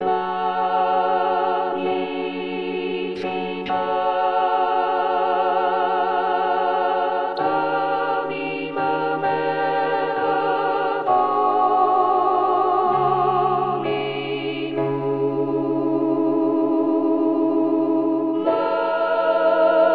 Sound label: alt 415 magnificat